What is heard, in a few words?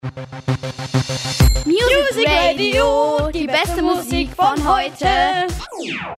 Jingle Outro